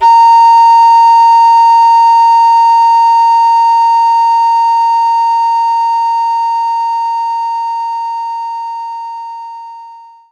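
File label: Recorder A#5; knights try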